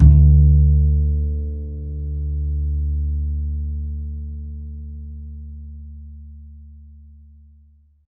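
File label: double bass D; Univers Sons; Jazzistic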